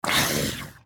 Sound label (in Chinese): 尸壳受伤声